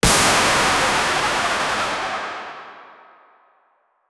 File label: jumpscare; fnaf